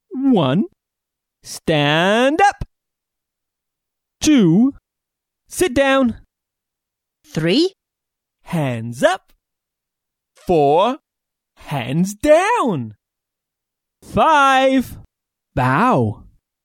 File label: Genki Disco Warm Up